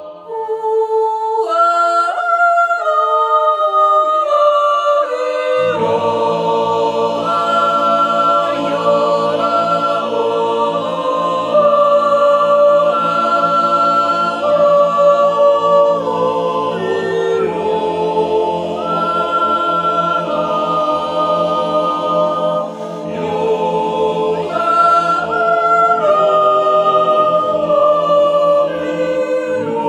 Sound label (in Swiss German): farnbueel jutz